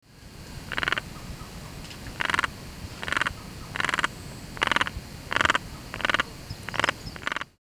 Dyscophus Insularis; amphibia

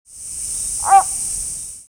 Plethodontohyla Alluaudi; amphibia

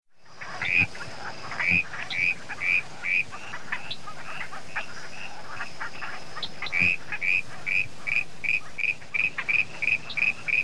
Hyla Leucophyllata; amphibia

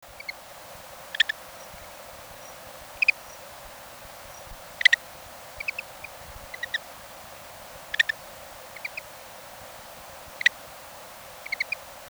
Eleuthe guana; amphibia

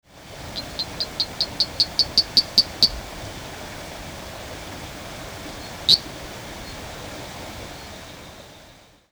Boophis Haematopus, amphibia; Fonozoo - Fonoteca Zoológica - Museo Nacional de Ciencias Naturales

